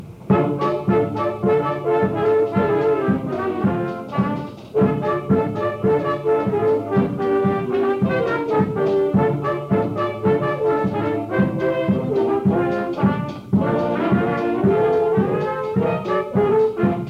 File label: Jazz; 1977; North Park